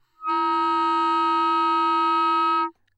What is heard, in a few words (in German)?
vielklang, multiphonic